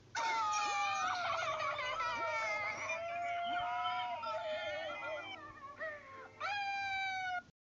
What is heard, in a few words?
crying sound